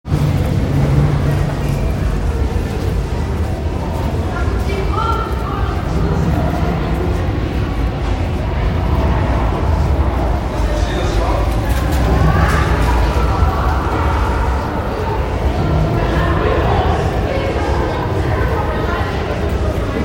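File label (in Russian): звук туннеля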